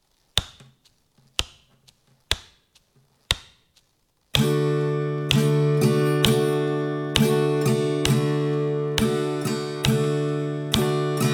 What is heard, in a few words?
Cowboy Strum